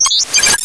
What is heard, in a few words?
window; XDM